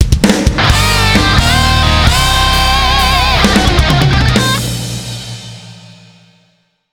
Win Perfect; Metal Tales Fury of the Guitar Gods

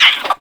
bug; Spanish; bug catcher

